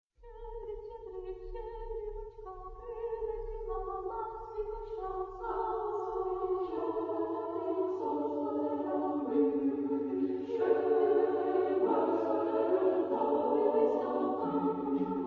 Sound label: choir masterpieces